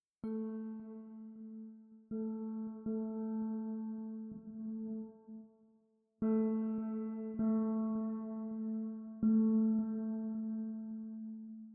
Melody Of Darkness; No More Room In Hell; Windows

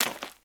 Foot Mud Shoes Walk

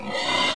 Tusker Charge; The Battle for Wesnoth

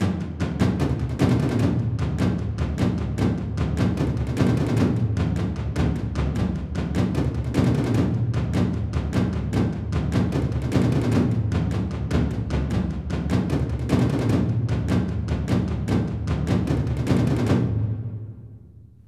Drums of the Enemy

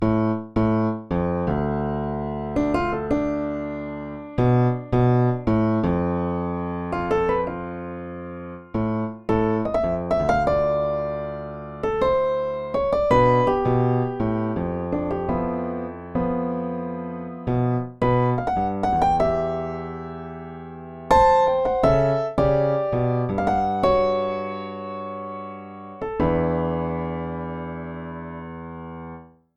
Piano Solo

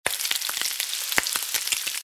Frying; pucca n friends ios

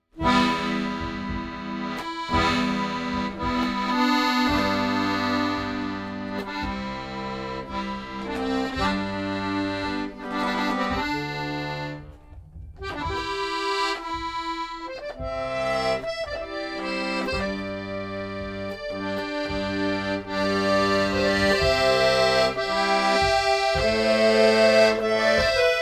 Accordion; Assassin's Creed Syndicate

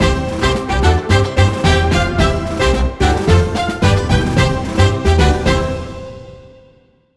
Collectable Fanfarre; togges

